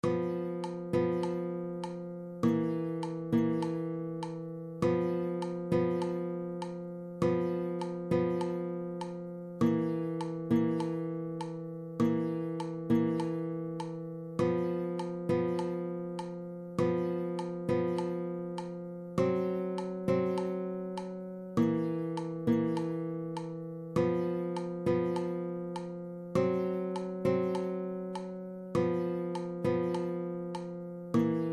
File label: Two Note Rhythm, guitar